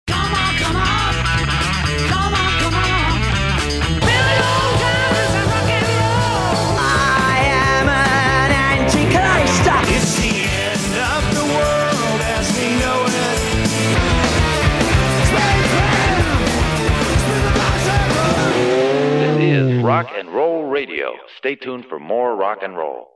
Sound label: rock n roll, jingle